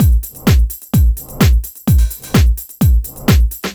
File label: Housebeats 128BPM; Vengeance Samples